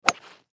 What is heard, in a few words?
Golf Club Swing, Golf Metal Driver Swing Impact Shoe Mud Squish; SPORTS; Blastwave